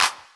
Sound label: clap